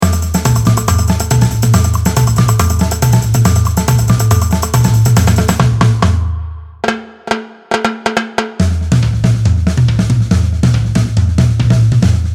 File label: Break Samba